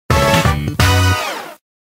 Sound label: Fanfares; Typing Adventure